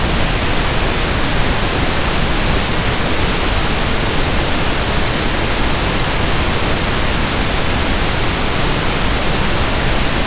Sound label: pink noise; ESP; syntherjack